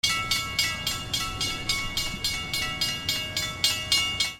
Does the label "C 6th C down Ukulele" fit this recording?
No